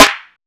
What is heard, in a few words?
sizzle snare drum sound C key, steel snare drum tuned to the C note. Loudest frequency: 1271Hz